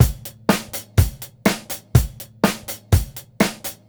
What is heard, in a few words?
BEAT, Roots Disco; USB Soundscan vol.32; AKAI